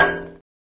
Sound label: metal plate; ion sound